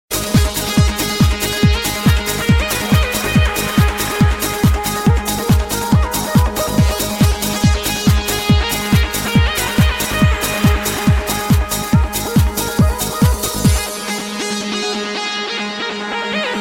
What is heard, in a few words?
sms tune ringtone